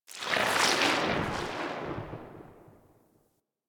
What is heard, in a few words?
Sentient Start Move, Enemies; Sentient Movement